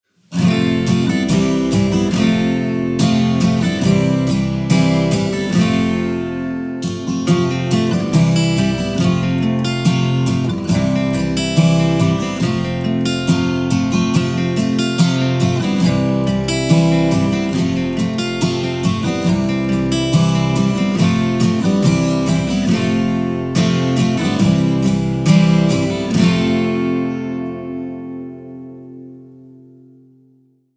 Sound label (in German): lied summ summ summ karaoke; mini spatzenpost; Schuelerzeitschriften